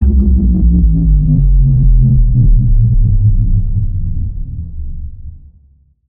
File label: landing spaceship